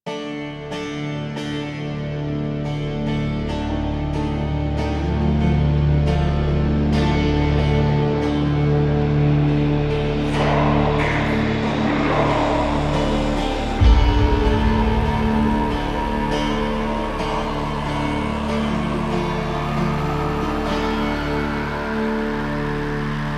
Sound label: Find Page Cue, Music; Warframe; DE; Entrati; TennoCon2023